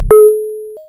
elevator

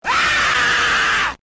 ghost car scream commercial; k fee

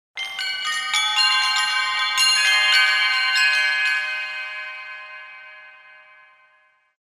chimes; eternal darkness

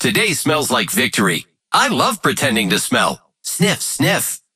sniff sniff

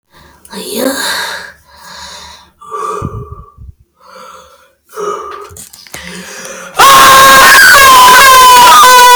scream loudest ever

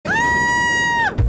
bottle scream; bfdi

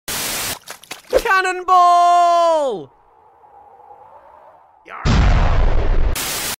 cannon ball; asdfmovie 10

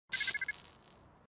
door denied; rust

